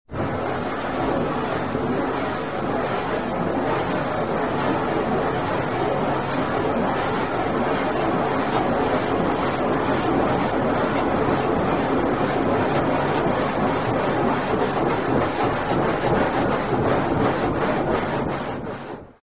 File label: Spraying Metal Car, hose, spray